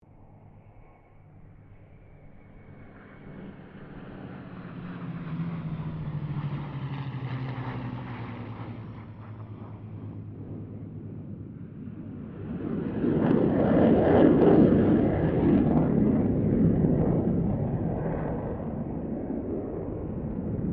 AIRCRAFT SABRE METERO MUSTANG KITTYHAWK HORNET PASS; Mixed aircraft squadrons; Airplanes (Sound Effects:Vehicles)